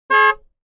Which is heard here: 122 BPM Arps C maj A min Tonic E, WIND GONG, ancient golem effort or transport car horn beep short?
transport car horn beep short